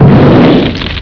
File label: burn mixed